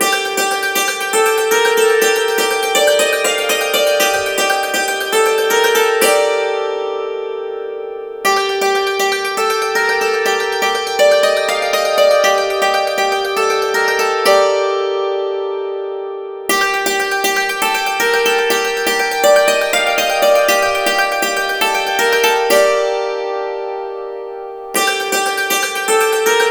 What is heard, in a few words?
dulcimer